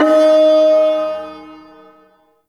Shawzin Oct One D Sharp; Music; Warframe; Duviri; Ersatz Shawzin

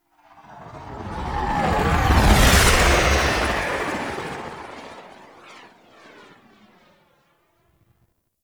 Blue Sky Liset Fly By Slow, vehicles; Warframe; Liset Flight

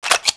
Clip Out B; Warframe; Weapons; Afuris

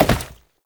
Step Glass, Foley; Warframe; Oraxia

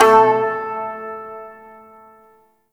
Shawzin Oct One A; Warframe

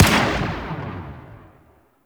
Burst Gun Fire; Warframe; Archwing

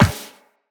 Walk Metal Perforated, Footsteps; Operator

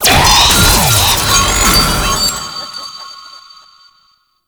Enemy Teleport In; Warframe; Follie Frame; Abilities

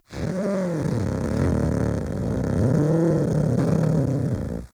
Kavat Vocal Idle; Warframe; Khora

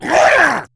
Attack, Battle Barks; Warframe; Grineer Ghoul Sawman

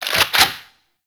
UI Menu Insert Coin; Warframe; Caliber Chicks Minigame; Title Menu

